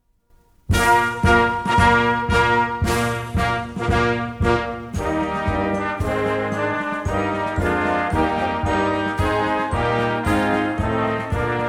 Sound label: Grand March; from Wales